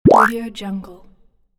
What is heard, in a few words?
bubble pop up